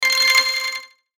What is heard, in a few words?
Logo Percussive Ring; Sonic Logo; Blastwave